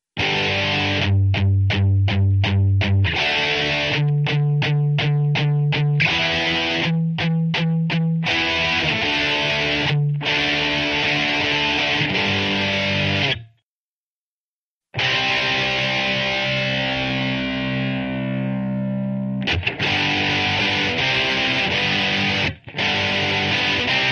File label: Rock guitar; yao gun ji ta